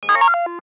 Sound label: Digital Bleeps; Beeps (Sound Effects:Multimedia)